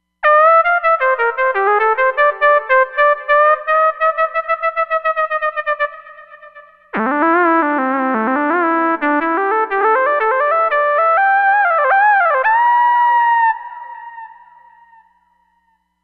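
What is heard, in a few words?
Mid Saw Lead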